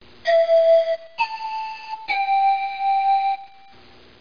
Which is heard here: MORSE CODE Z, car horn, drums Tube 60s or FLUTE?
FLUTE